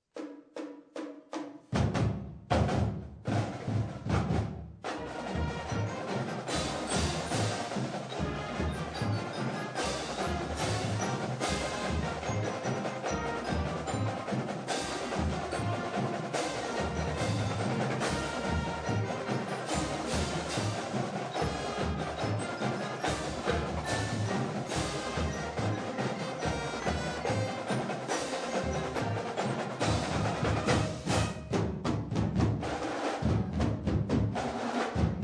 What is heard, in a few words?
fight song